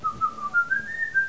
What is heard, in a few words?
whistle lofi